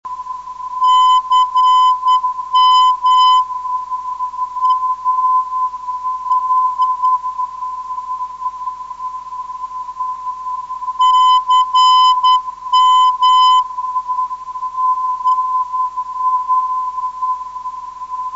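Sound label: 369 kHz, non-directional beacon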